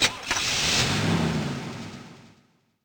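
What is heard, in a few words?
Car Start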